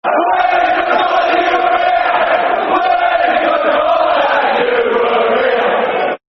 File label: We Forgot That You Were 'ere, Football Chants (Soccer Song); Chelsea Cfc